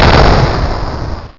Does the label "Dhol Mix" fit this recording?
No